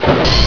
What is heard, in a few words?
walk